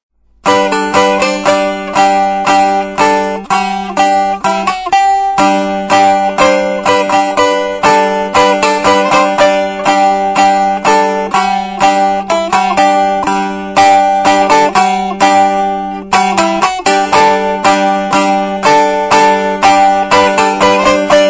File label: strum; strumstick; christchurch